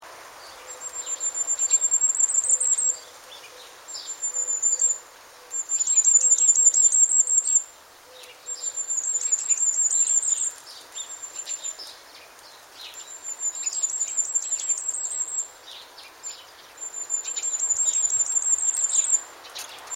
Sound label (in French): roitelet appel